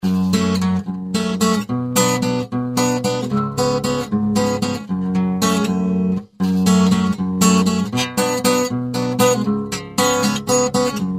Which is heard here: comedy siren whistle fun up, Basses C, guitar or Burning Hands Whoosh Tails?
guitar